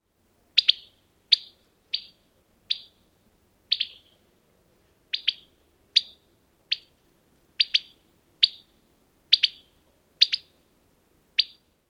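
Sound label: Carolina wren alarm female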